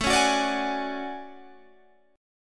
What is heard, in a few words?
D-Major Sharp 11th, A